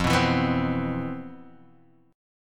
C Major 7th, F, guitar chord; Cmaj7/F strummed; JGuitar

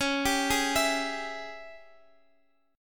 Ab Major 7th Suspended 4th Sharp 5th Db

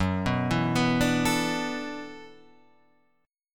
E 7th Suspended 2nd Suspended 4th, F sharp, chord

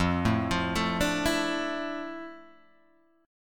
F Major 13th Guitar Drop D

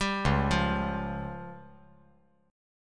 C sharp Major Flat 5th; C#Mb5 arpeggiated; JGuitar

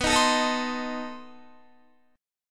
B 7th Flat 9th B Guitar; B7b9; strummed

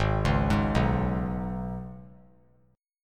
Ab Minor Double Flat 5th down; Abmbb5; arpeggiated

